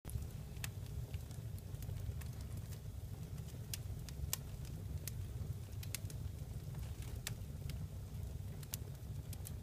Small Fire

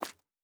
Footstep Concrete Walking

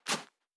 Footstep Sand Running

